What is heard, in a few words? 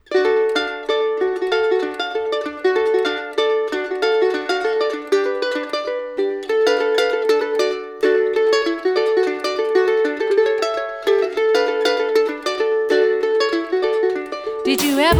Rockin Chair Blues